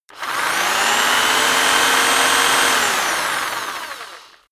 rock drill rev low long

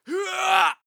male attack shout long; schreie; menschen

